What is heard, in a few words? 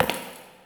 Idle Single Step; Ambience; Tagfer; Warframe; Entrati